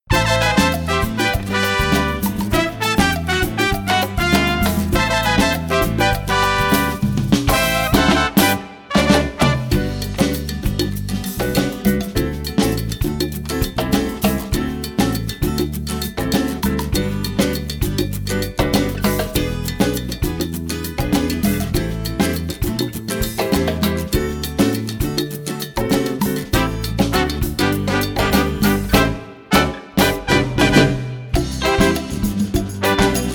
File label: Reprise 7 Dwarf Instrumental